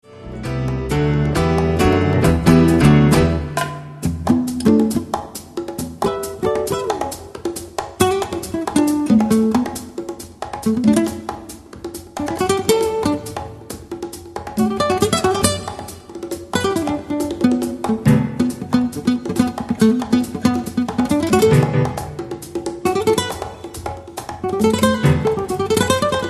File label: percussionata